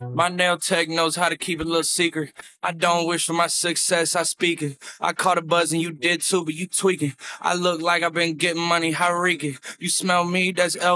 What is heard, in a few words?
Acapella; Clarity Vx Pro